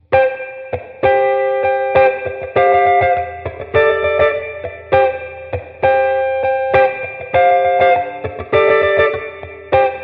distortion wet

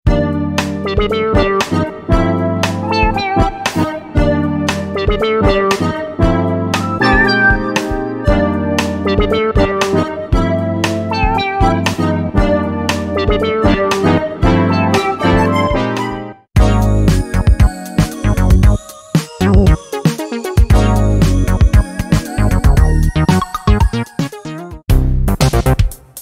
e funk synth

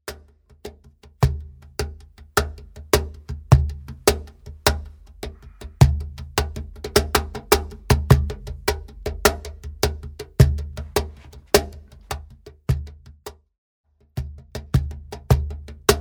157 3 4 rhythms

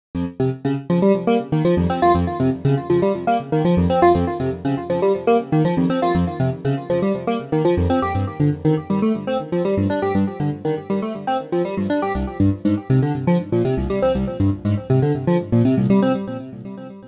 seq 16 light and airy sequ

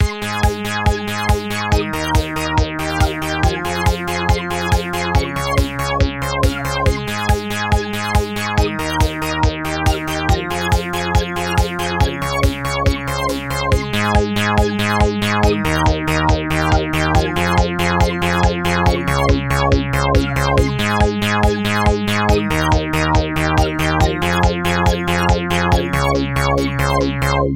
roland jx-8p synth bass

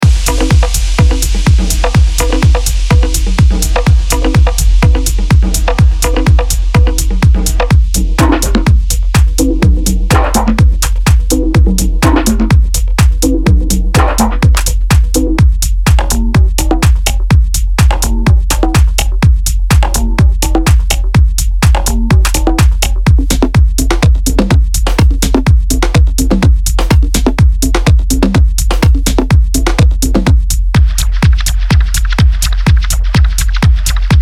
techno percus; datacode; focus

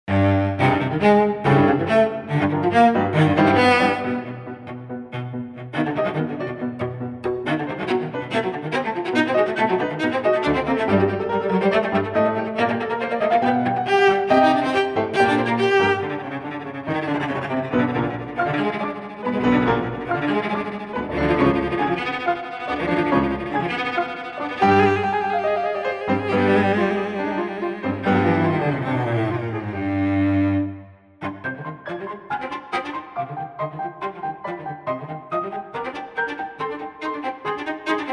modern cello playful

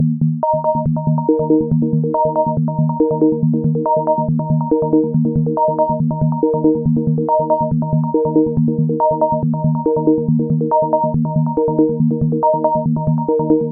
softly my keys 140 bpm

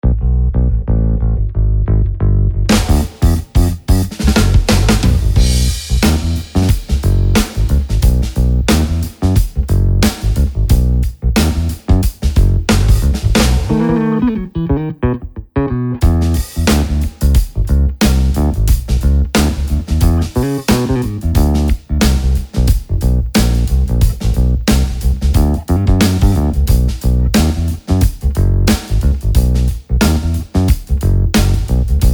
classic finger bass

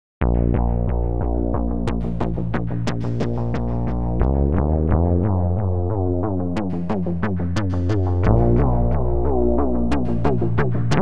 the cat step seq

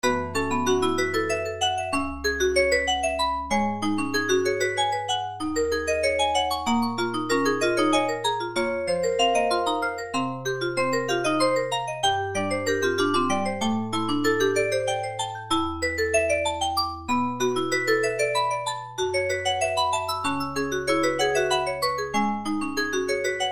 syntheway vibraph; incantations

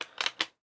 ar lock and load rifle

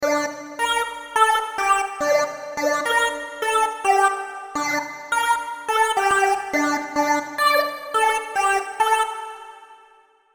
serum plucks; chiqui audio

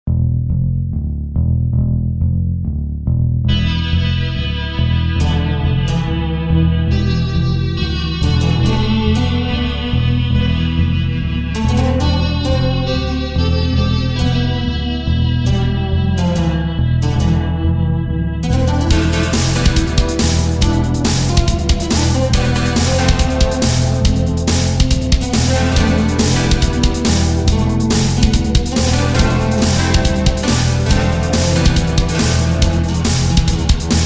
strum test

